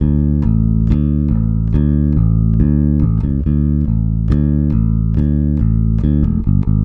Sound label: Country Bass Chorus; Chorus Sec 2; Digidesign; Pro Tools Essential Loops